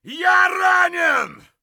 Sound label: hurt light; Escape from Tarkov